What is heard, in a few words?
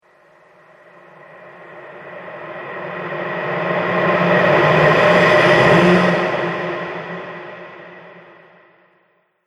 Shadow Spirit, ghost; horror